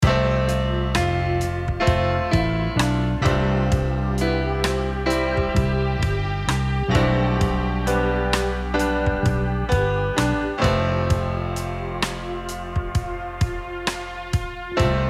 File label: Piano submix